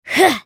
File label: Sad Mummy effort; Character Voices; English